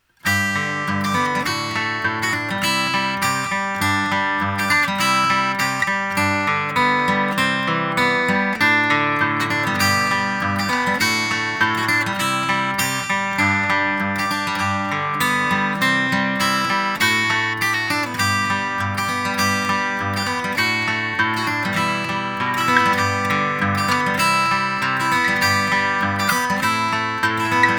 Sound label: acoustic guitar 1073 EQ-B; slate-ik